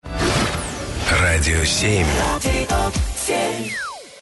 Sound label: Radio